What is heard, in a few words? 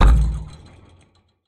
Ventilation Hit; Game rip; MiSide